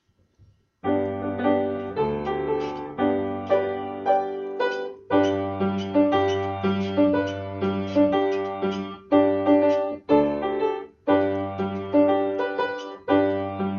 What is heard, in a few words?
Piano; Music Fest